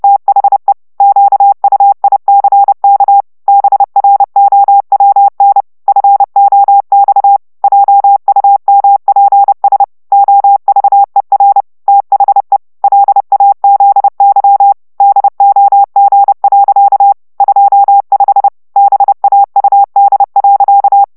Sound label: CW 25 baud